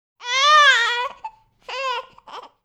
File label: Sound Effect - Baby's Cry